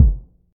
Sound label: deep kick drum single shot g key, Royality free kick sound tuned to the G note.; kick drum samples